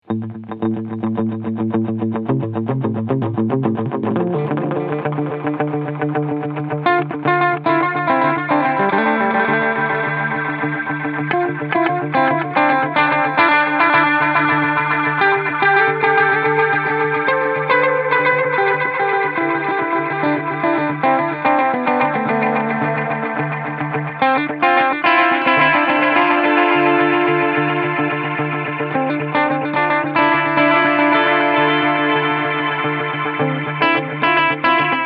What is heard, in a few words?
Catalinbread Echorec Style Low Cut